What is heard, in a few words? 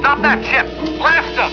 blastem